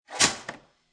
Toaster Bread Pulled Down, Household, Toaster, Bread Pulled Down Into Toaster; Appliance; Appliances